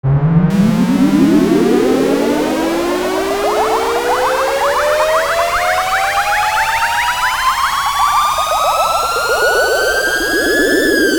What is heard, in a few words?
Multi Riser